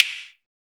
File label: CYBER, Drums; Drums In Your FACE!; CYBER PRC